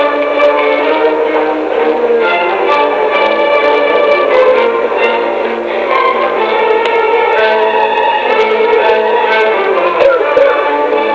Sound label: orch victory